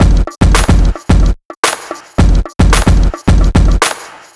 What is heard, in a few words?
Clap That